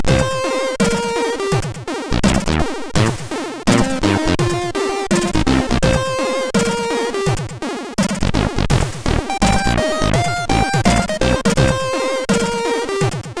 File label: Short Jingle, CSG8580R5